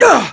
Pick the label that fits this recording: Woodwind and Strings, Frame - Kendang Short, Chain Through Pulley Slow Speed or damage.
damage